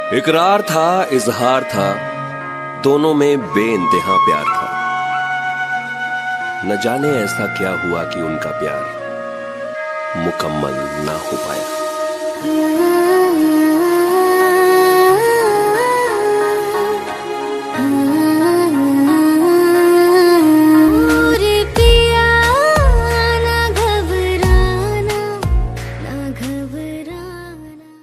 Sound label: bollywood ringtone